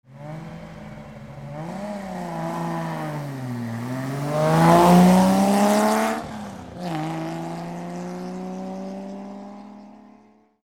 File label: alfa, engine